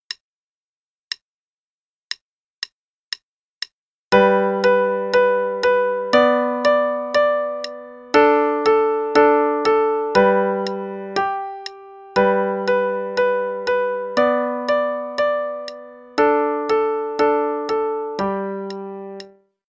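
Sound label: Play-a-long, quarter note=120; Carefree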